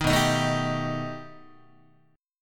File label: B Augmented 7th B down Guitar; strummed; JGuitar